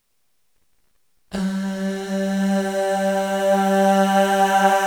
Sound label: ahh